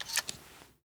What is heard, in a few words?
sawnoff shotgun insert shell; Rust